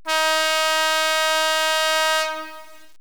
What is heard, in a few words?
horn, Federal Signal; 52